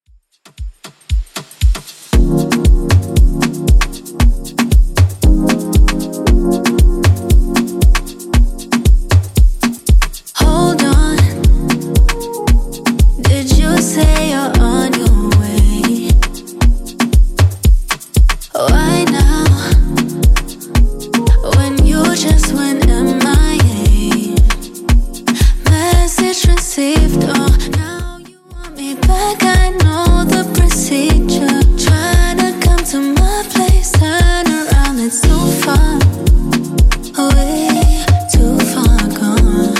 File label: Top40 Redrum 116bpm